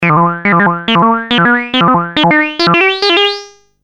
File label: quak